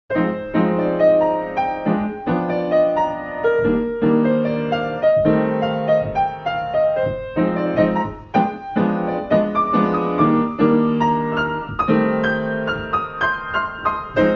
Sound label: piano waiting room; DELTARUNE